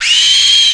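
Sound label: spider hit; eqclassic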